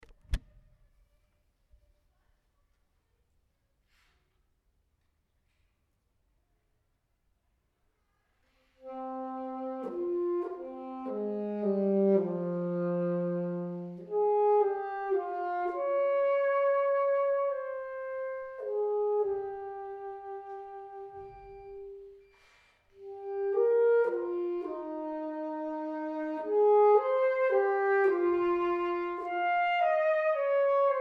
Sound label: Alto Sax; allstate